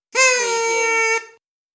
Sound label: Party Horn